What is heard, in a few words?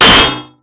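destroyed hydrant; Explosions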